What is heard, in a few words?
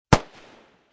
M16 Bridge, Assault Rifle; Assault Rifles; Guns; VRSonic